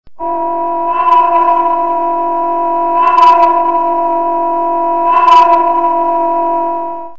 scifi alarm

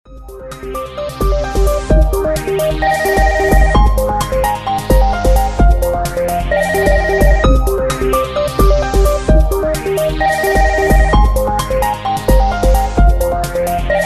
iphone subway

